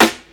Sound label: studio snare drum e key